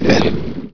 Squalo, Verso dello squalo quando cerca di mordere Lara; Il JukeBox di Lara

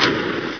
Flare, Accensione dei Flare; Il JukeBox di Lara

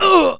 Nemico ferito, Voce di un nemico quando viene colpito; Il JukeBox di Lara

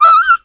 Cane morto, Verso di un cane quando Lara lo colpisce mortalmente; Il JukeBox di Lara

